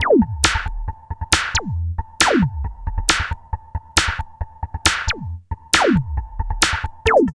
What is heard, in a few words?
LOOP, Tekno Industrial; East West